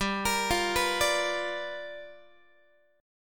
G 7th Sharp 9th G chord, Guitar

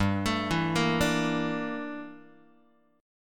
G Minor 6th Add 9th G down Guitar; Gm6add9 arpeggiated; JGuitar

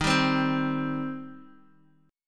Eb 6th Eb, Guitar Open D; x,6,5,6,3,x

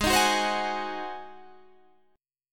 G sharp 7th; G#7; 16,18,16,17,19,16; strummed

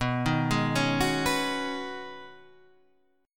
B 6th Add 9th B, chord

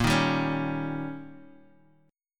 D sharp 7th A sharp, guitar

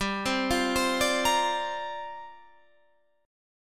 C 7th Suspended 2nd Suspended 4th, G

